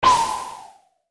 ping burst zap notification; sound ideas

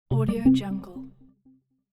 video game power up

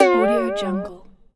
cartoon; blackdragonfx

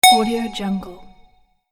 ethereal ding

